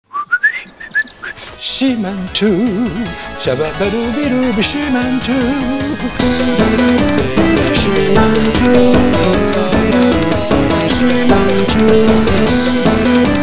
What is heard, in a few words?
DISCO; SEA-MAN